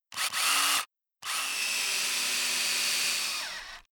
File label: power drill; time-lab